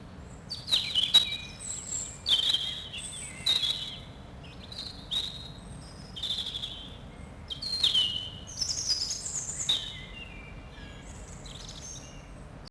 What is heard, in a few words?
Birdsong